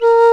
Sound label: HRP RCDR&FLT